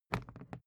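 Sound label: briefcase plastic pick up hard, Büroarbeit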